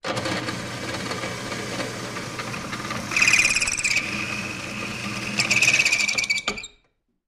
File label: Drills Drill Press